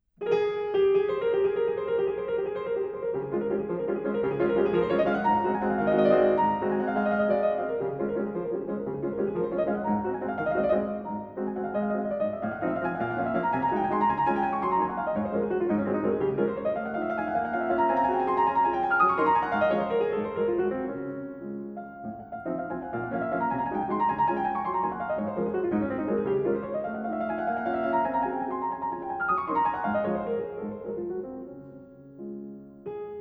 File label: Waltz In D Flat; Op. 64 No. 1; Minute